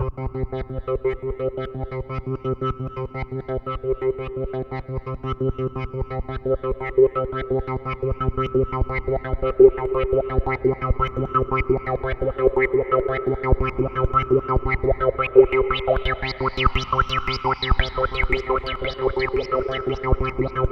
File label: C2, CLUB ORGAN; Roland JV 2080